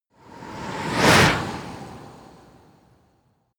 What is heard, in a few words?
whoosh bicycle hard